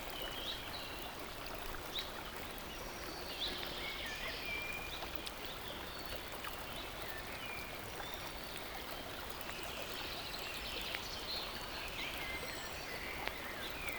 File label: forest river, ambiance